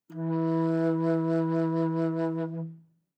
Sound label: Bass Flute ff E3; Woodwinds